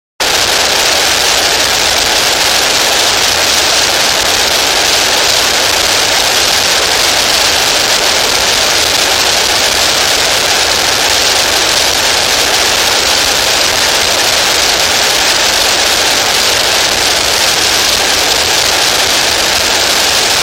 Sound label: Morning Noise Broadcast